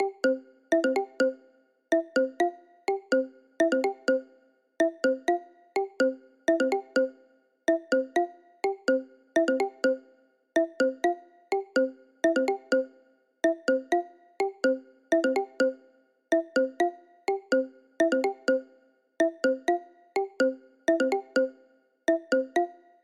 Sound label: incoming, calls